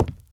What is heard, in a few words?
hardwood step; Golden Crayon Sounds